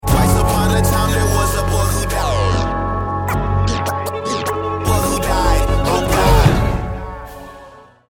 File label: deathcam